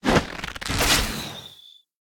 minigame SFX enemy attack basic cast; bloom minigame

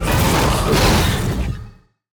attack slam cast; bloom minigame

